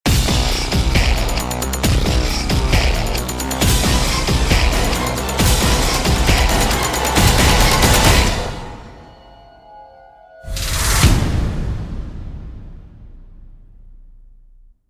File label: grit and gravel; brand x music